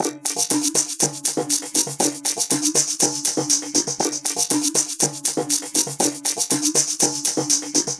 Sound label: Shaky Groove, Electronica Composite Beats; Sound Effect Library